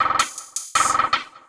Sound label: LOOP, 160 BPM, Drum & Bass